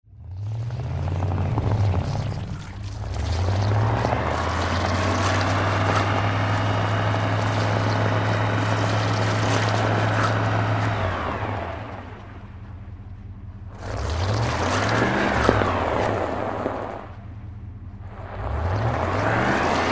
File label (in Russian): zvuki probuksovki